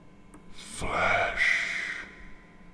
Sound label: zom ambient